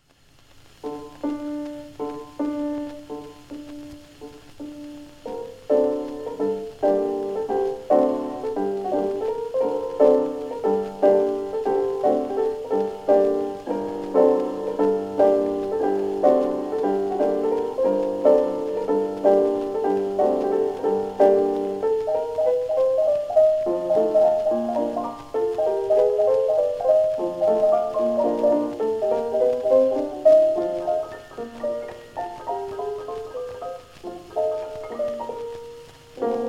Mazurka No. 1 in G Minor

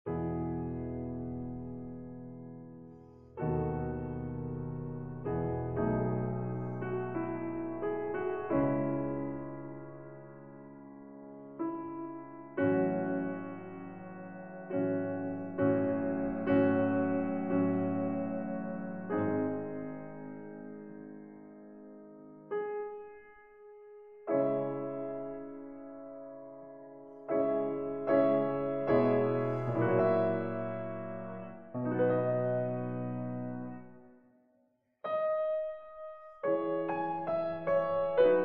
Piano Concerto in C Minor, Largo

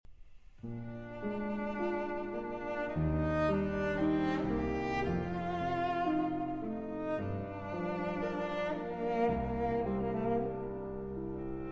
Cello Sonata in G Minor Largo; Op. 65 III.

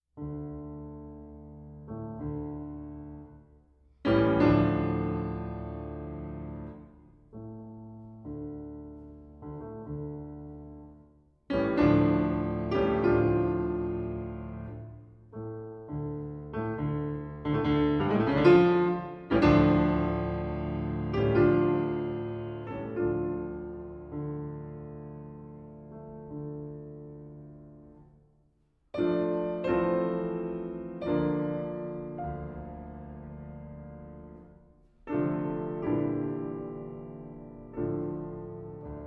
Piano Sonata No. 1 in D Minor Allegro Moderato

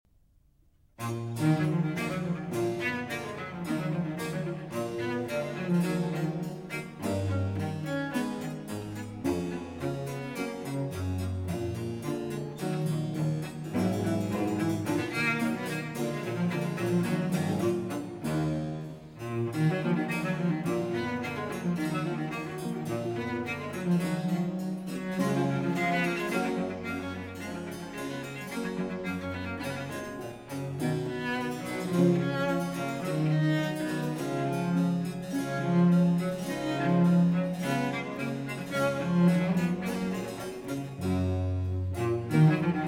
Sonata For Cello and Continuo in E minor; Vivaldi; R.40

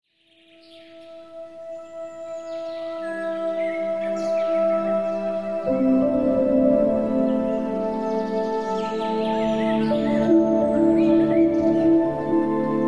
Calm Piano Sounds; Wellbeing

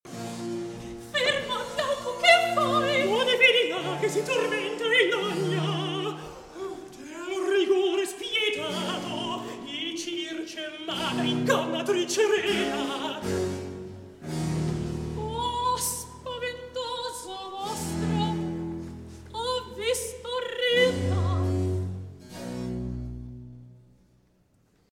Recitativo; Ferma, Glauco, Che Fai; Polifemo

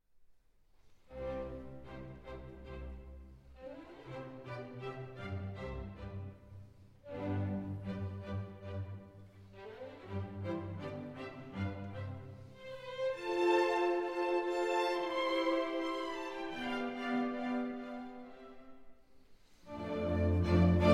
Piano Concerto in C Major, Allegro Con Brio

